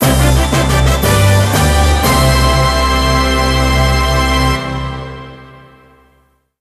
Music Game General event

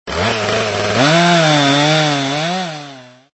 Saw Sound

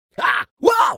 fall; Talking Tom Gold Run

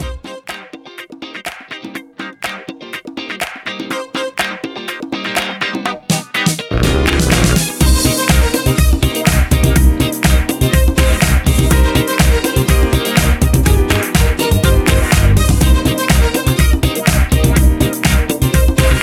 Rhythm Theme (123 BPM); Yum Yum Cookstar

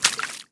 land water; Aragami

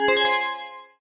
Sfx - Combo; Farm Mania

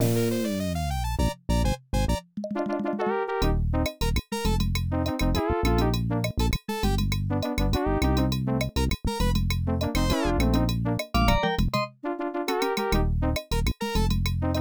Salsa; the incredible machine; ibm pc at

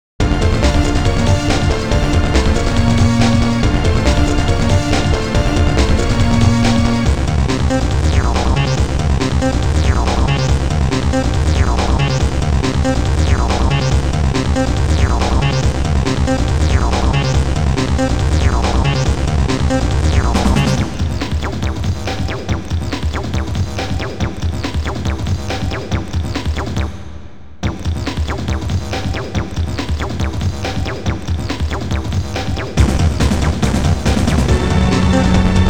Battle Map, Desert Land; Langrisser Milennium

